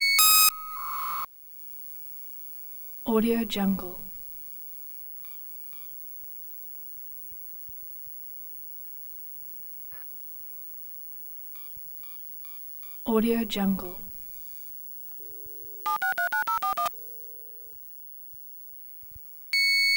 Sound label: credit card scanner